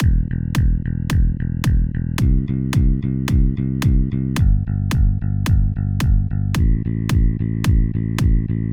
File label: Bass Kick EQ